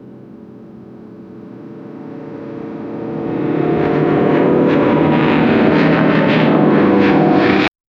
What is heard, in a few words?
the sound of a propeller, aircraft